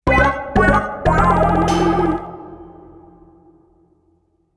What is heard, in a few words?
Long Distance Relay and Swim Defeat; tanjou s saturn version original soundtrack perfect collection